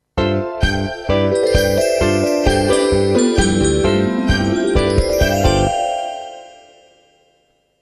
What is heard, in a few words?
Jingle, Clear Bell; Magic Sudoku Numpla Oekaki Puzzle; psp